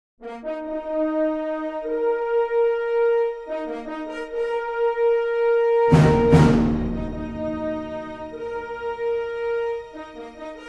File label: Fanfare